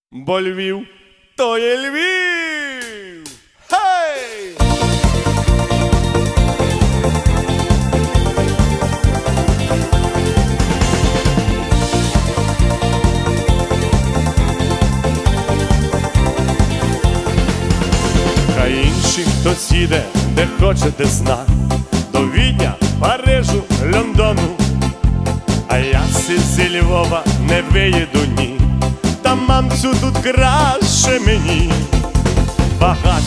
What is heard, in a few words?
cover наживо ketron event yamaha modx7